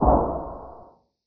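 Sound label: hgrunt machine gun distant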